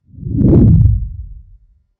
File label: fluid liquid swoosh